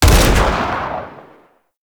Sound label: Revolver Rifle Shot, Weapons; Warframe; Tenno; Mod Quest